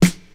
2000s clean steel snare drum sample f key, modern snare drum